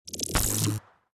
Skill Electric Shock; Starbites

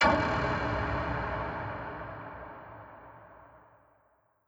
Dracosoldier Shield; Legend of Keepers

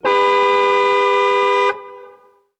Horn

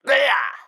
Damage; Pecheneg Red

